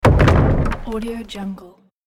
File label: wood door close and lock